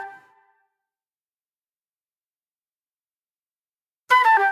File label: Sparse Flute; Sonic Foundry (Sony Creative Software); Manhattan Flutes; Garage Funk